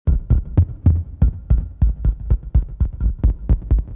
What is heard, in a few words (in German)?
Klingelton, modular synthesizer; Superbooth22